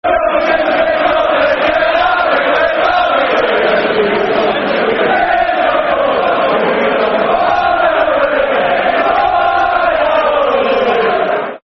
Yorkshires Republican Army Football Chant (Soccer Song) Leeds; fanchants; Leeds Lufc